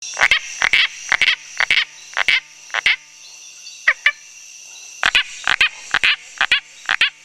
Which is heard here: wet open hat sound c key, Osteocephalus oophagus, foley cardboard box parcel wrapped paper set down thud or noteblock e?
Osteocephalus oophagus